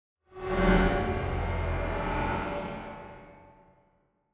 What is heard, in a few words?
Feedback Metallic Sweep Rear To Front